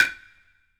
OHTSU, TUZUMI; AKAI S6000 CD-ROM - Volume 5

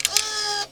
GATE DOWN, Synth FX Misc

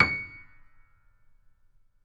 Mf C#6, Grand Piano; AKAI S6000 CD-ROM - Volume 2; Grand Piano 256M

